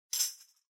Tambourine Headless Shake

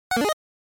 NES RPG Treasure

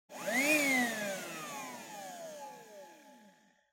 Vacuum Cleaner Vacuum

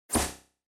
TV Turn Off Dry

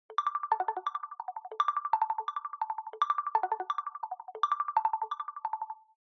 Smartphone Alarm Speaker

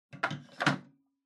Public Phone Receiver Hang Up Gentle

